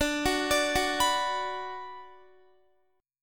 D 6th D down Guitar, chord; D6 arpeggiated; tuning 4E,4A,5D,5G,5C,6F

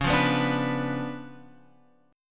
Db 7th Flat 9th, Guitar, Open F; x,8,5,6,5,6; Db7b9 strummed

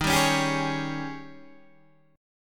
D sharp 11th D sharp

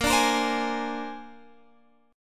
A sharp 9th A sharp Guitar Open F; strummed

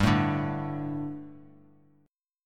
G Suspended 2nd G, down; Gsus2 strummed; JGuitar

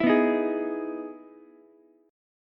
E Major 7th E down Ukulele; EM7 strummed; JGuitar

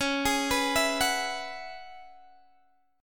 C sharp Minor 11th C sharp, Guitar DADGAD; C#m11 arpeggiated

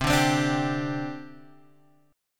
C Suspended 2nd C Guitar Open G

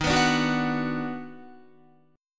Eb Minor Major 7th Sharp 5th, Guitar; strummed; chord; JGuitar

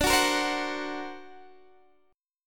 D Augmented 7th D down Guitar DADGAD

